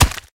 hit

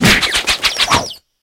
hit

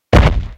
walk

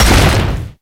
hit

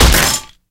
hit dice

